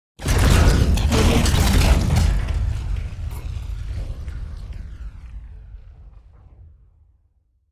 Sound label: concrete debris falling down